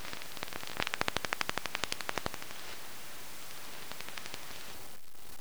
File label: Brown long eared bat echolocation call; Philip Briggs